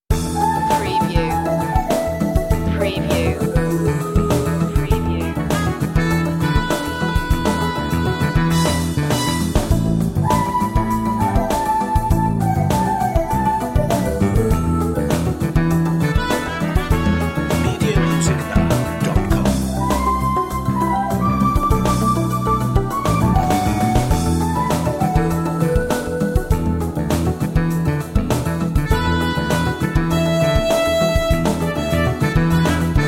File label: fusion break